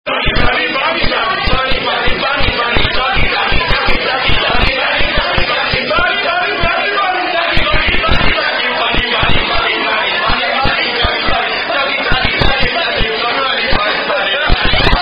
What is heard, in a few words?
non-drinking game; Bunny Bunny Non-Drinking Game Called Kum-Cha